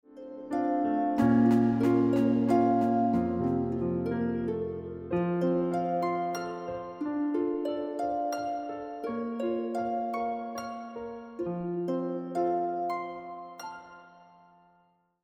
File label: Accompaniment